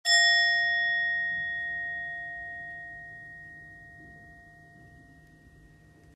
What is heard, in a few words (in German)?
Klangschale